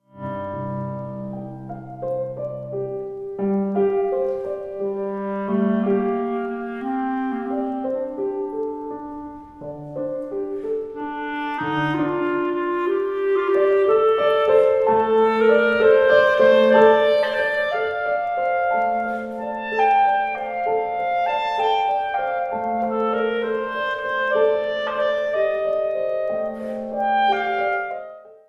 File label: violin sonata in d major op 12; howard ferguson